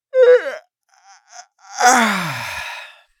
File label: Man yawning large and exaggerated